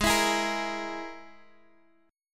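Ab Suspended 2nd Sharp 5th chord, Guitar, Standard tuning; strummed; x,x,6,9,9,6; JGuitar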